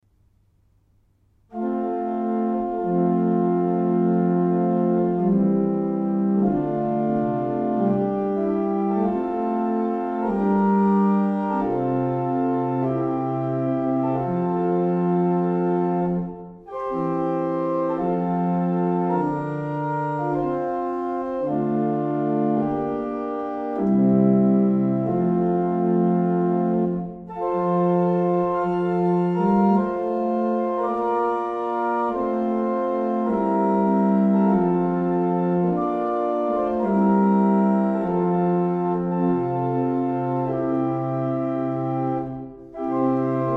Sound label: Irish, barrel organs; Faulkbourne